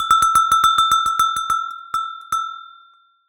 Cowbell Chain, Percussion

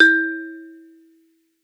Bonang Para EQ, Gamelan

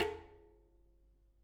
Bicycle Spoke

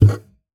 Classical Guitar Extended Technique

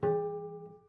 Prepared Piano

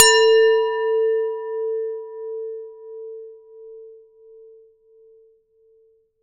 Hard Hit, Large Wine Glass

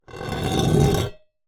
Drag, Vase

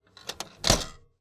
Typewriter

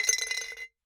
Bottle Struck

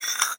Hole Saw Scrape; Hole Saw Bits

